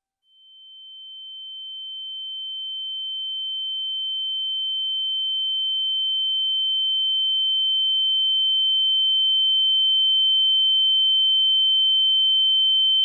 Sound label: ear ringing